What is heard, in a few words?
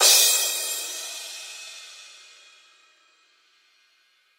Crash, Drums Cymbal